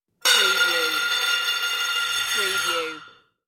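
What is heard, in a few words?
Metal Lid Spins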